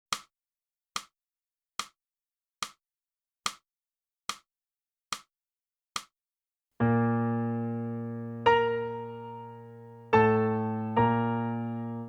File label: sax, vocalise alla valse